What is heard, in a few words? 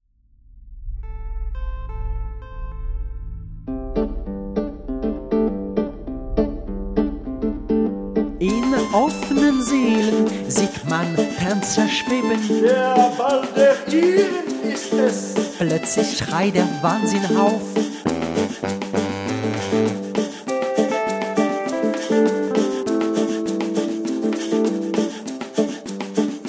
samba; berlin